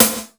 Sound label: MONDO SNR, METALIC DRM; Crypton - DrumBase5000; METALIC DRM2